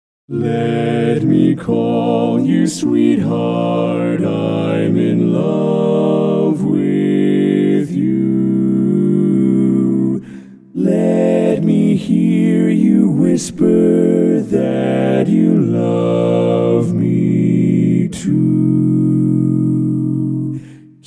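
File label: full mix, A Capella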